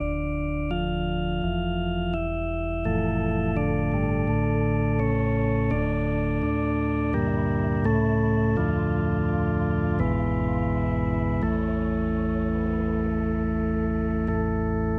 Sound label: Klaglied, audio karaoke; Buxtehude